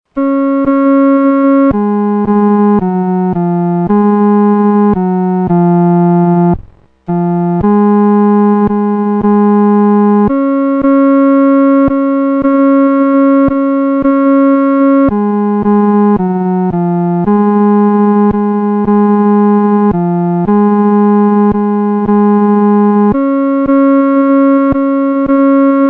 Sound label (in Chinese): 独奏 第三声; Sweet Hour of Prayer; William W. Walford